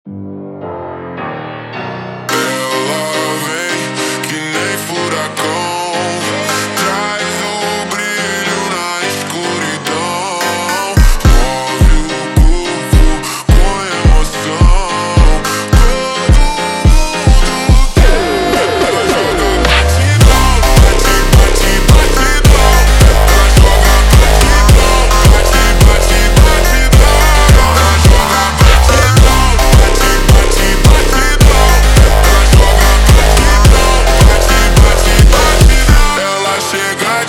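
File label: super Slowed, phonk music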